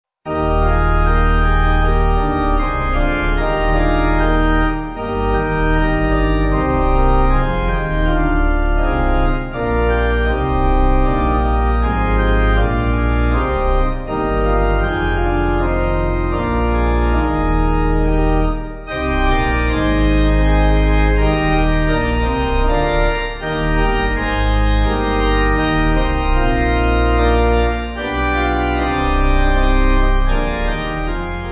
When You Woke Joyous Light Pipe

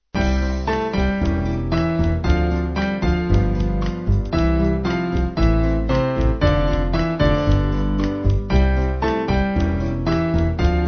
Band

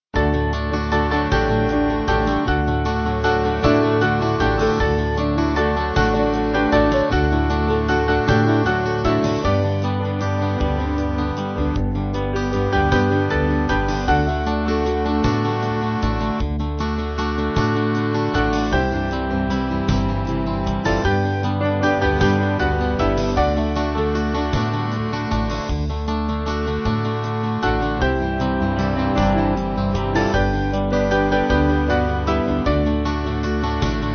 Band

